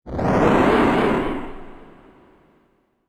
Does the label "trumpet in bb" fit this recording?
No